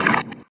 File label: weird, sfx